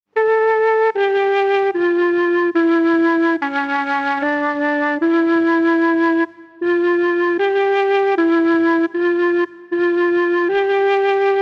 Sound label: Mellotron